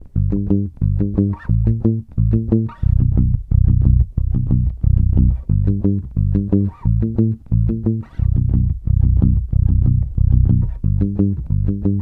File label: Bass loop 90 Bm, Electric Bass Loops Direct; Slate Digital BASSHOLE Sample Pack